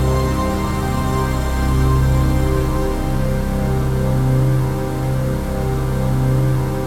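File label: chord pad, Pads; Best Service; XX Large - Pads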